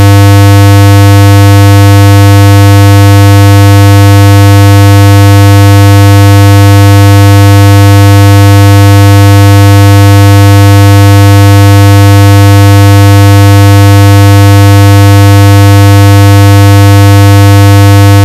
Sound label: 100hz rectangle